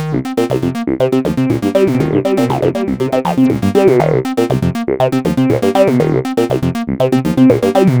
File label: 303 loops; Sonic Foundry (Sony Creative Software); Ugly Remnants VOL-2